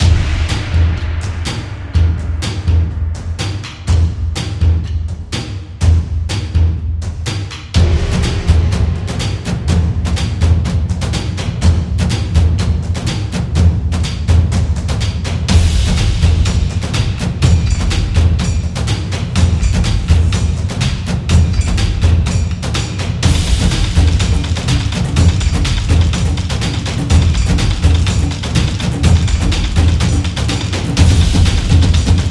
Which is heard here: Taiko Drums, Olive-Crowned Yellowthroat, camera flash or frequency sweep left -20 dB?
Taiko Drums